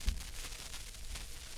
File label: Vinyl Crackles